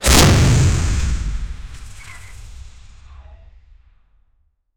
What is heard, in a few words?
the user does some stretc, exercise